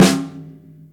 long snare drum sound g key, snare single hit tuned to the G note; snare drum samples